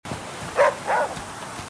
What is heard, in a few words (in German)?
hund; The Flame in the Flood